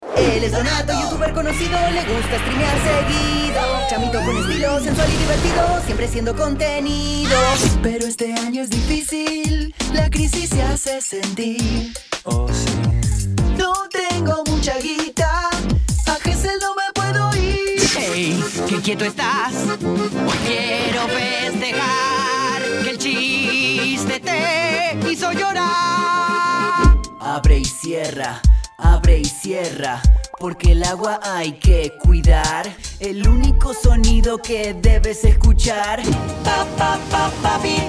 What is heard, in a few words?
songs